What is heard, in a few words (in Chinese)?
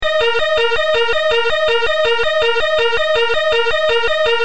急救, 告警; 广播室铃声系统